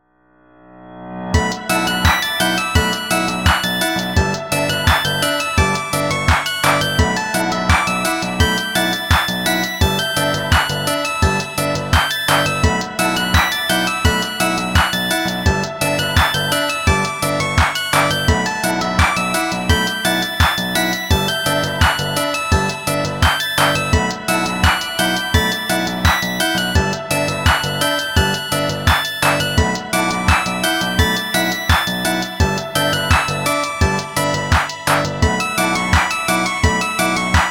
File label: A happy and calm music for game forest-like; walk in the twilight forest